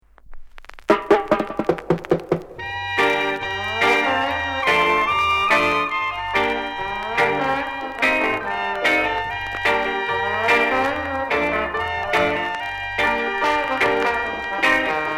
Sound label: DISTANT DRUMS; Ninja Records